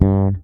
FRETMUTE G, PEDULLA BASS; Masterbits CLIMAX 7 - Bass

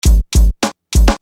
Beat, Hip-Hop Street Beats

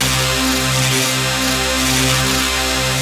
basses; Wizoo - Powered Wave

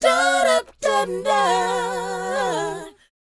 DOWOP C#; ILIO; Vocal Planet

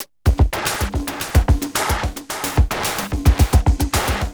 Beat, Industrial

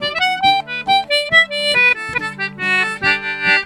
Celtic Flavours; USB Soundscan vol.42; AKAI

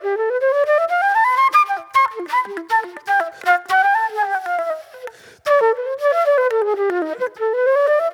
Old Soul Flute

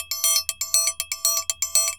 TRIANGLE, DRUMS SAMBA; Best Service ProSamples vol.14; AKAI

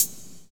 hi-hat, 808; HH Modules

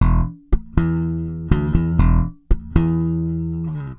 SA BS EM, BASS SALSA

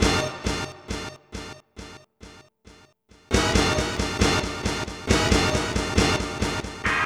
Hornz F, Lounge 136; Vegas; Zero-G Kocktail Kollection; Construction Kitz (Lounge); Vegas Time 136

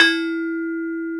BRONZE, Bronze Gamelan; E-MU Formula 4000 Series Vol. 4; Earth Tones

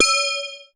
C#, BELL GONG, Synclav FM; Big Fish Audio

